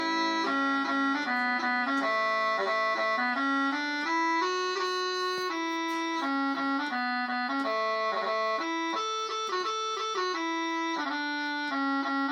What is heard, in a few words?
Sax